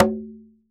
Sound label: B, congas tuned; Q Up Arts; Denny Jaeger Private Collection